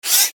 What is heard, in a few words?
Rollover Mechanical; Mouseover, Digital, Click, Warble, Tone; Multimedia; Production Element, Imaging Element, Accent, Transition; Mouseovers; Blastwave